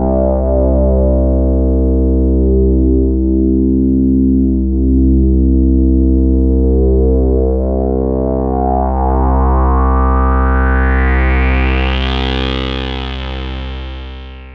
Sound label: pad C2, Oberheim OB-Xa